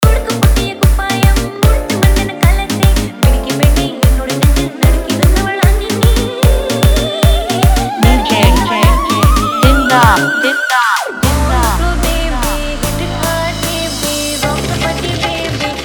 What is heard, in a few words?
Mashup Dj Mix; Audience Choice Mashup 2020